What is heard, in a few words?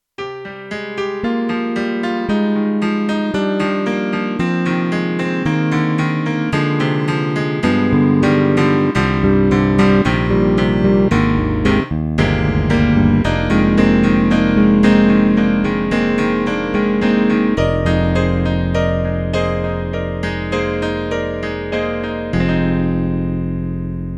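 YAMAHA MU1000EX Bass and Piano Brass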